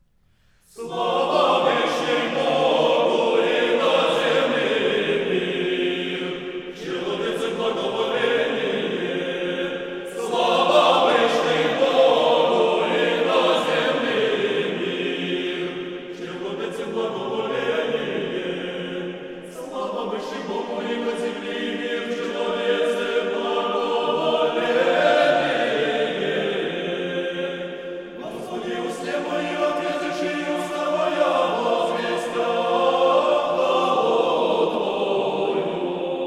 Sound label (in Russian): Stihi pered shestopsalmiem gruzinskogo raspeva